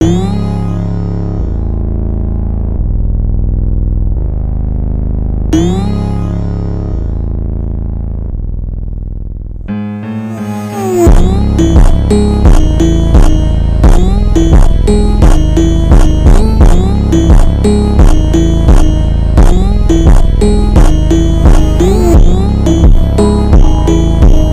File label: INSTRUMENTAL Ultra Slowed